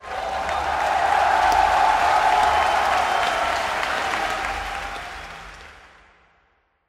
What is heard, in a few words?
cheer dire, spectator; dota